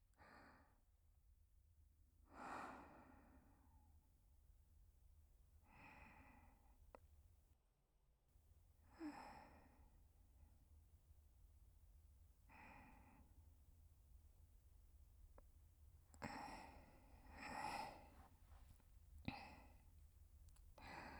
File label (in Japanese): 寝息(右側), ASMR